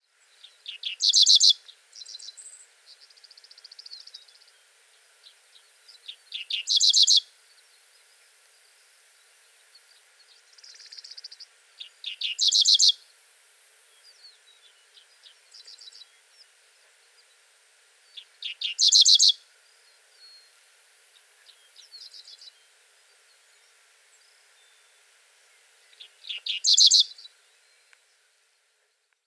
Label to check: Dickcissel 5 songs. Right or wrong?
Right